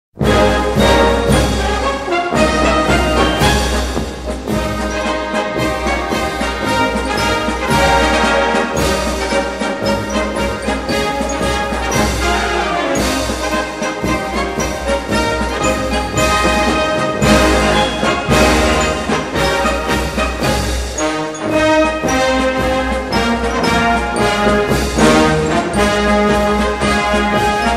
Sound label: Japanese Navy March